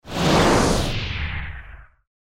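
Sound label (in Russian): вьюжный короткий; Звук ветра; Звук ветра для перехода в видео или для монтажного перехода в аудио монтаже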